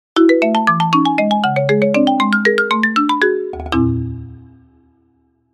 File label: GameCube Intro Startup Marimba